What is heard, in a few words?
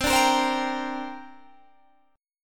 C 7th Suspended 2nd C down Guitar Standard; C7sus2 strummed